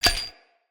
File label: Dendrit Staff Caster Foley Grab; Weapons; Staves